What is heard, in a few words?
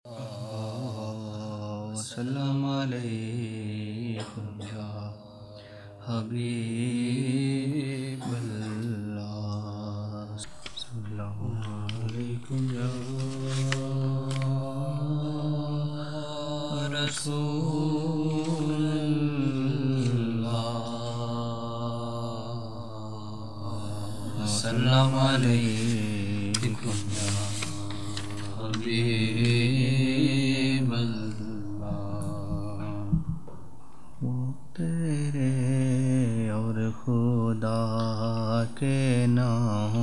naat; wo saw teray aur khuda k na hoon darmiyaan phir hai kia faida zikr azkar say